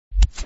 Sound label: click; ArcherWorrior